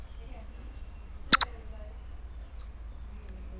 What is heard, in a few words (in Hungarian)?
aranyfejű oroszlánmajom; szegedi zoo 2011